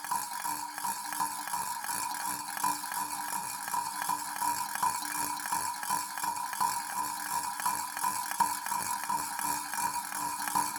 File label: Rotation, Metal, Shallow, Roll, Rattle, Loose, Churning, Steady, Loop; Hzandbits; Elegant Machines